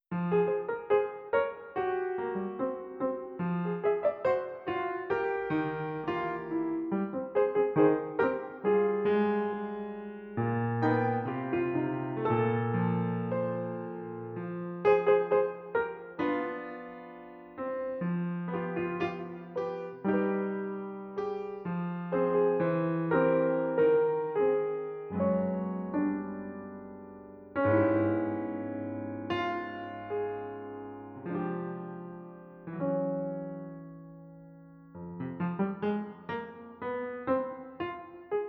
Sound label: piano